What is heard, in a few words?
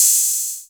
BOX HAT OPEN, GM drum set; AKAI S-Series CD-ROM Sound Library VOL-8